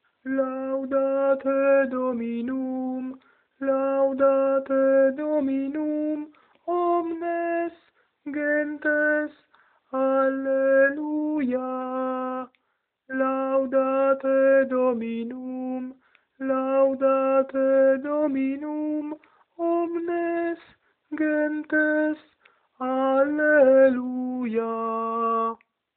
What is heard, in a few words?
Laudate Dominum tenor